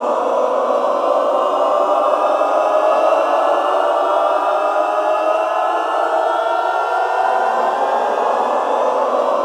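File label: Glissando ENDL, Classical Choir; Best Service; Extended Classical Choir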